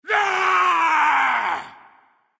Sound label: battle cry; smite